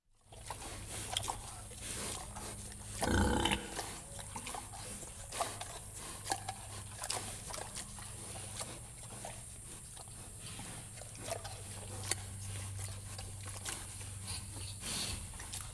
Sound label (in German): grunzende schweine im stall; Auf dem Land